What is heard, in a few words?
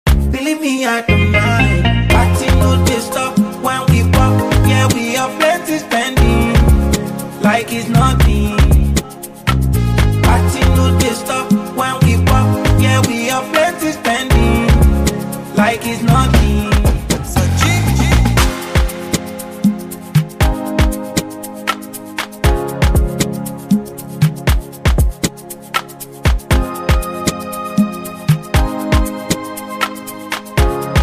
Instrumental plus hook